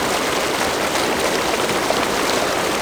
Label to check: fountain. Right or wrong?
Right